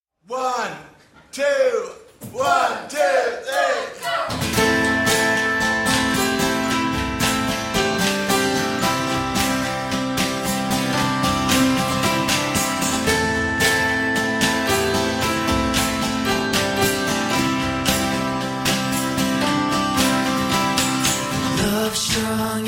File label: acoustic version